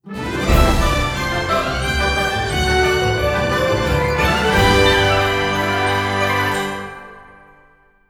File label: Win Theme